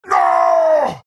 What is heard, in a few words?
bearform death; dota2; lone druid